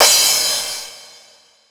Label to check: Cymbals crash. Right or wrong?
Right